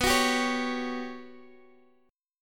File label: G Major Sharp 11th B, chord